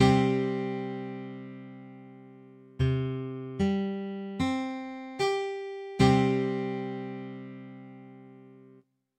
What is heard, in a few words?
C5 Power Chord, Guitar Chords